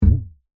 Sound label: cartoon impact thud wobble